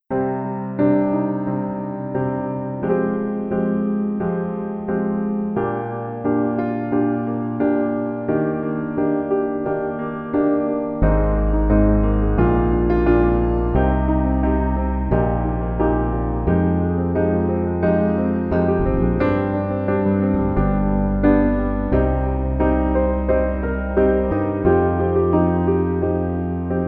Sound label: Piano